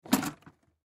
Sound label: Case Open Luggage; SoundEffects-Blastwave